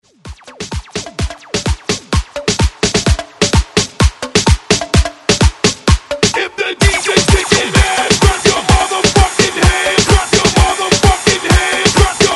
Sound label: Hype Extended 128Bpm; DJDOLLS